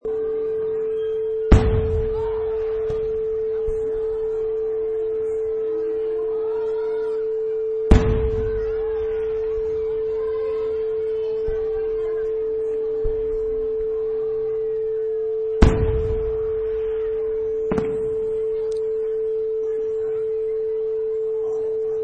Firework Display

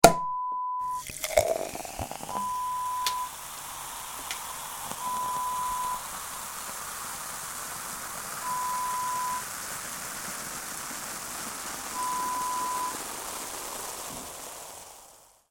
Champagne Pop sound

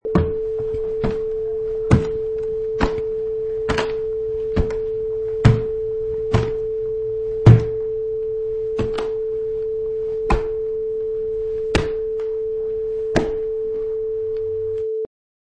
Footsteps on Wooden Stairs

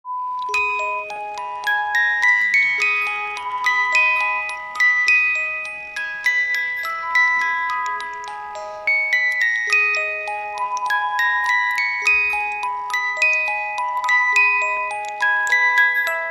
music box sound

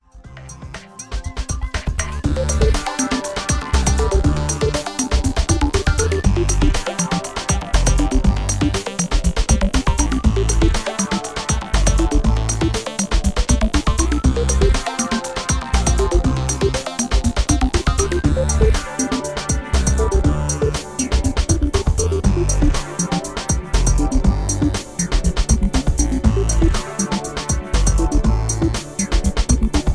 Electro Ambient

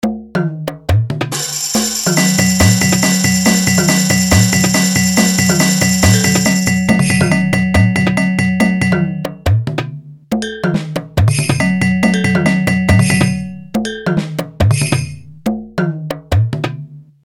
world drums

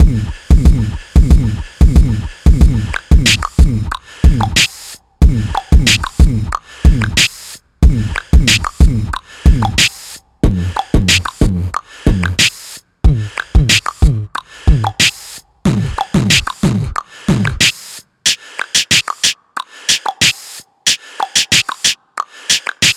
Beatbox Drum Kit

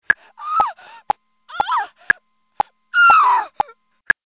scream

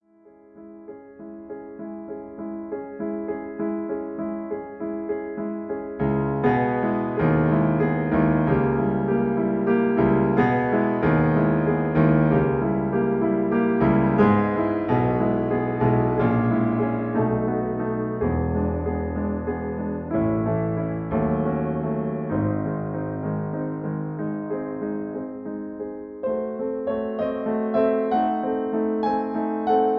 Accompaniment C sharp; Automne

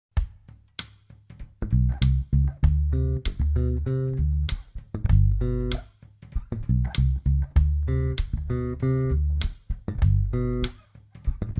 Palm muting doublestops D7 to G7

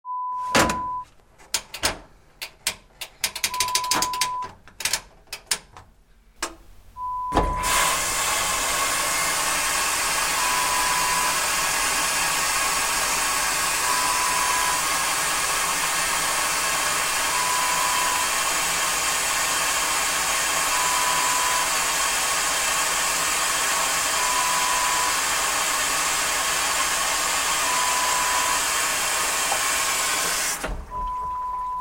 Washing Machine sound START

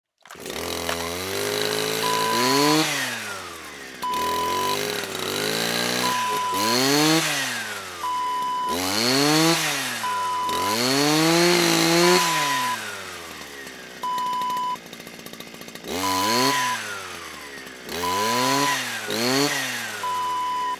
brushcutter

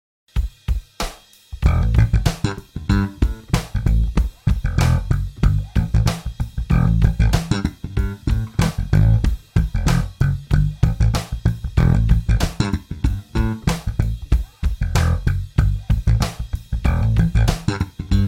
Double thumbing bass groove in A

